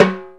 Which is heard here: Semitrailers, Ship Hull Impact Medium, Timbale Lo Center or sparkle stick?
Timbale Lo Center